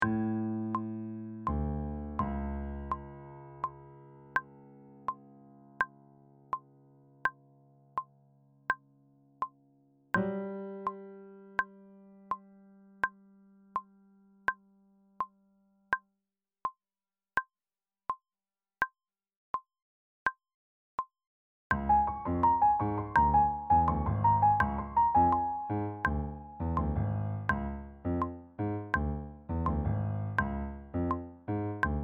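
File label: cuban 80 tempo alto saxophone 2 and bassoon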